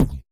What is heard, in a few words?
perc, Roland MC-909